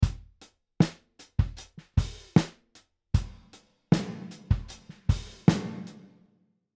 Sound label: Snare Small Hall; Reverb